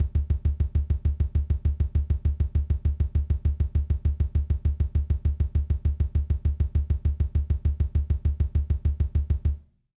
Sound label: Foot Exercise, drum